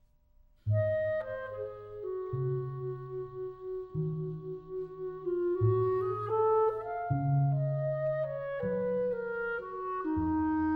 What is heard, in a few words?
Clarinet and Double Bass - Slowly